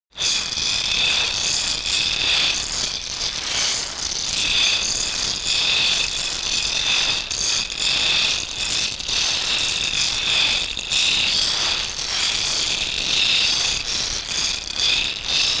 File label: shaker wood rough constant rustle